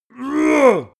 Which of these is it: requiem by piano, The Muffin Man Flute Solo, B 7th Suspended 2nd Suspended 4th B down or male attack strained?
male attack strained